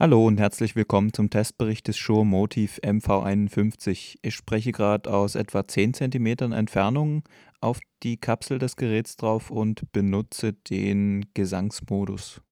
shure motiv mv51 vocal 10 cm singing

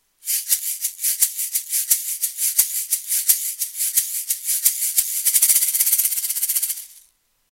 t.bone sc450 usb shaker

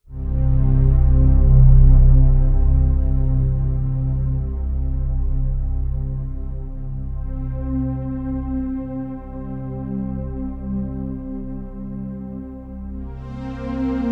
waldorf quantum pad

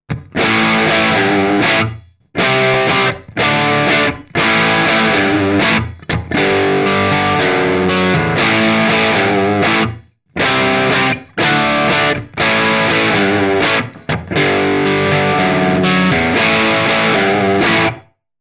digitech gsp1101 crunch

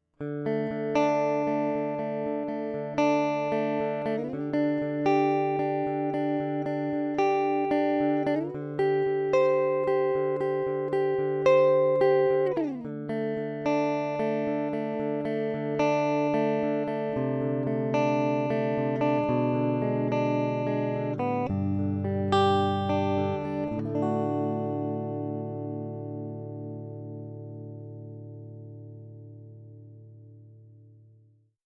harley benton te-90flt bridge neck clean picking

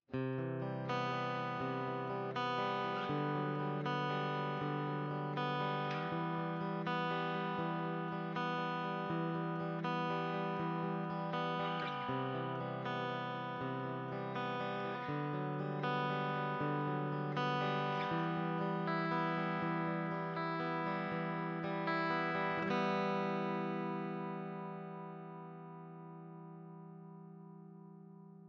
Buffered Bypass

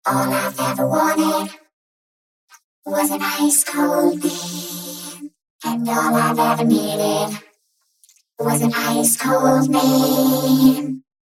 vocoder; arturia v collection 8

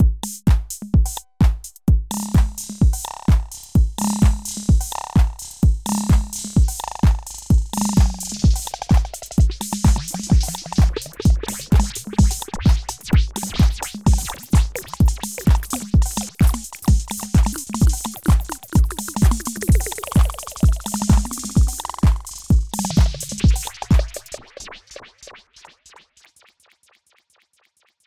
roland tr-8 delay

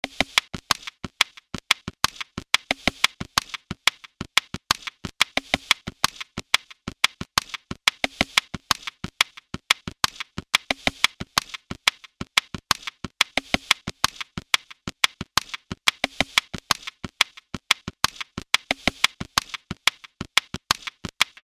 drums tube more dynamic

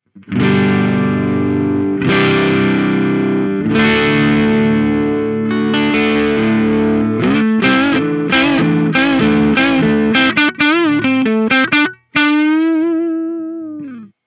kammer tiny k clean boost; kammer tiny k test

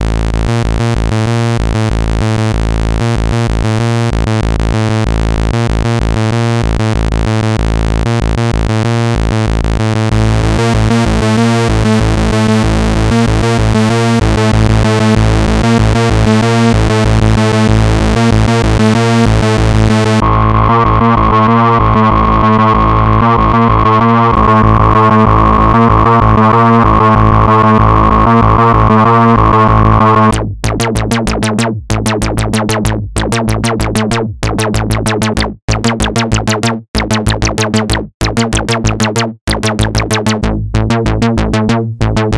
korg ms-20 hardware vs plugin; Korg Collection

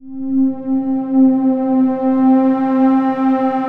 sample pad

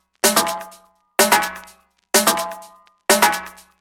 tribal perc 126; tunis; Wave Alchemy Loop Tools and Grooves

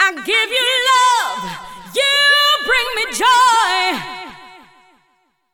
Alto Zephyr ZMX124FX USB FX stereo delay

